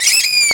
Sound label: Unknown Jingle; SNES; Yadamon Wonderland Dreams